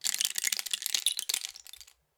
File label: Rain Stick, Percussion Samples